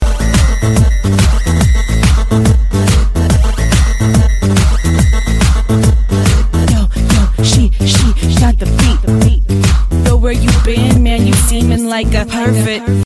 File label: energy beats ringtone